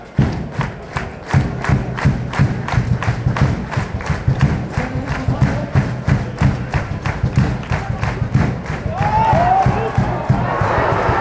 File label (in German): klatschen und trommeln, fanchants